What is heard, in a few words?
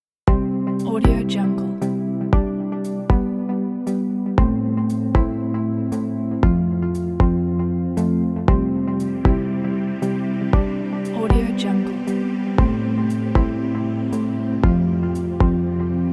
best motivation